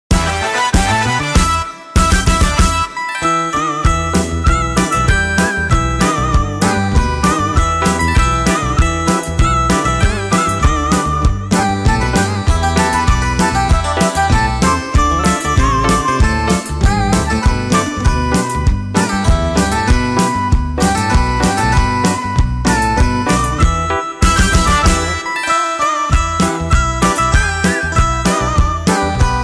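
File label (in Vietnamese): nhạc kết thúc chương trình đám cưới organ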